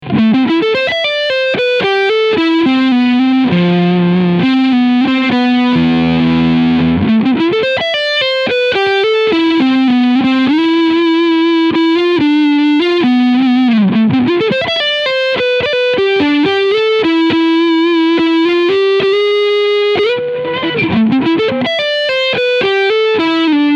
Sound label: Ibanez AZ2204 Lead Sound Front Singlecoil